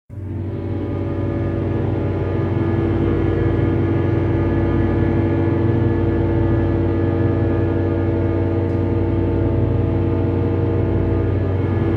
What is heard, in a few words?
Dark texture drone